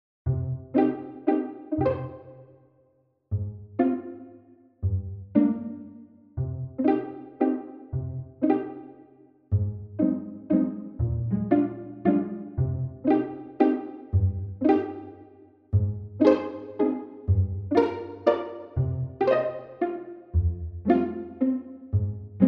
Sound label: waltz